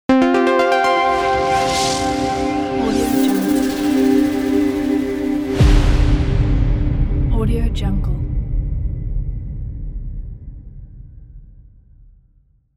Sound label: ambient soundscape logo